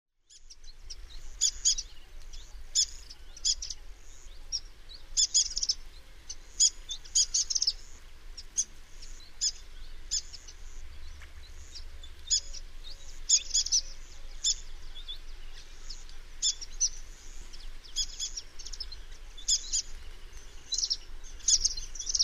Astrild a joues orange Estrilda melpoda, chants des oiseaux; Guadeloupe